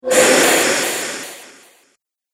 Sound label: Sewer Broken Pipe Gas; Scary Soundscape Sound Effects